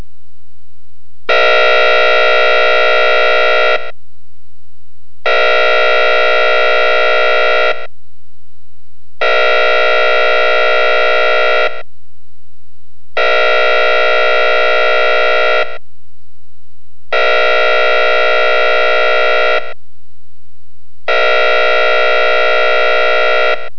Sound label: all clear alarm air horn; lyondellbasell